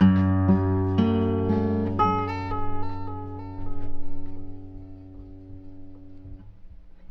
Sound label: guitar, Loops, Sad